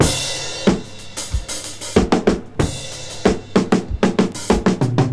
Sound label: 93 bpm drum loop d sharp key